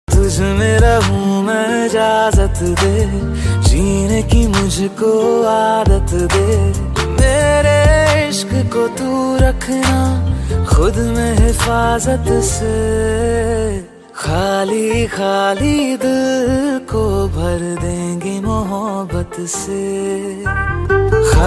Romantic Song Ringtone

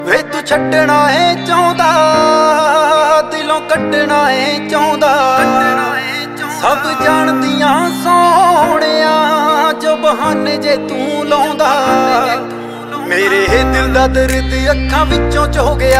New Punjabi Breakup Song Ringtone

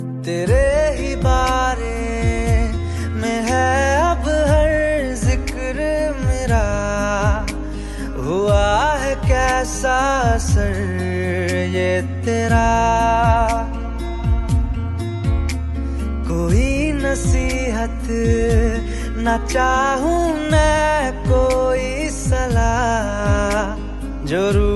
Hindi Song Ringtone

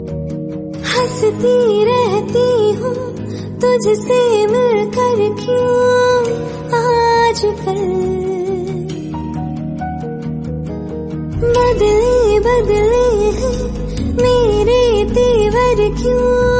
Female Version; ringtones

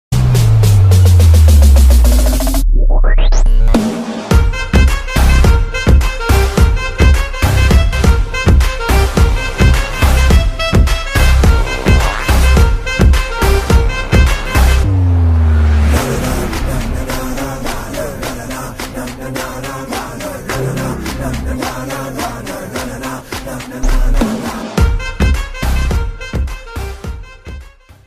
Instrumental Ringtone

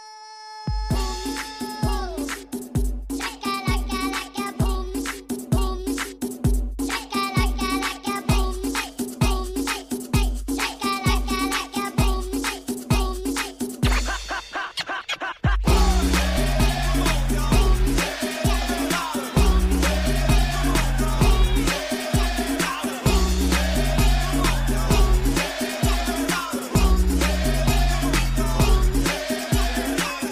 English Remix; Hollywood

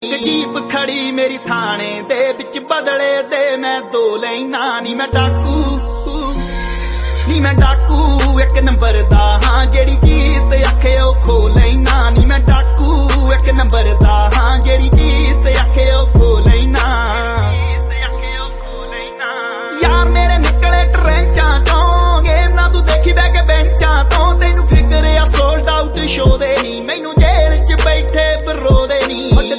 Punjabi Song Ringtone

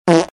Fart Tone, Funny Ringtone; ringtones

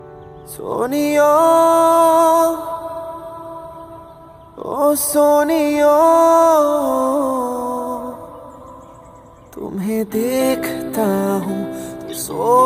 Song Ringtone

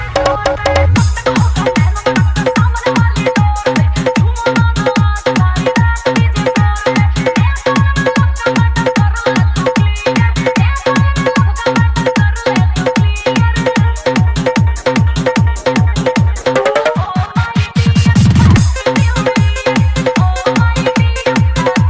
Happy New Year Ringtone; ringtones

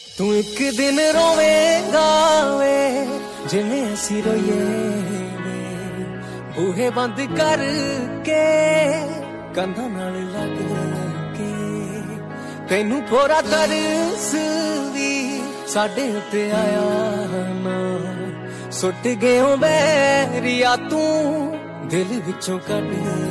Punjabi Sad Song Ringtone

